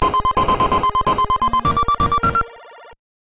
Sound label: Home Run!; baseball pros; quattro sports; nes